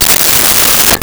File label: Cordless Electric Drill Tighten; Tools